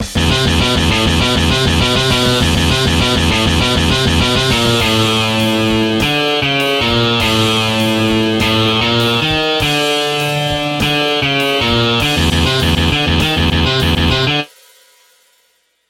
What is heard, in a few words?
3 riffs